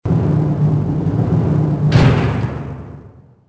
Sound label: arm door move; Soldier Of Fortune II Double Helix; XBOX